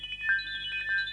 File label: ATMOS